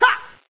attack female uh; Palm OS; EDGE

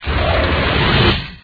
monster attack; Palm OS; EDGE

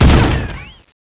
hit, vulture; StarCraft v2.2.2; terran units

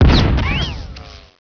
hit, vulture; StarCraft v2.2.2; terran units

